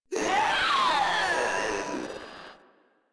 Proto Infested Runner Vocal Attack; Enemies; Vocals; Warframe

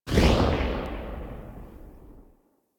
Arch Long Rifle Tail, Weapons; Warframe; Archwing

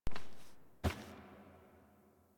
Foley Step Turn; Teshin; Warframe; The War Within

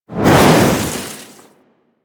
Look Whoosh; Ballas; Warframe; Quests; Chimera Prologue

